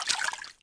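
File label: footstep wet; UrbanChaos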